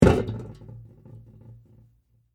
Flower Pot Down, Set, On, Wood, Metal, Container, Full; Household, Flower, Pot, Set, Down, On, Wood, Hard, Metal, Container, Full; Set Down Sound Effects